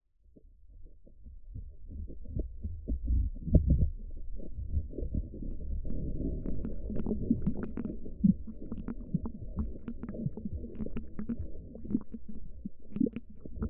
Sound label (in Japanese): 海中ASMR